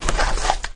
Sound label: run, flood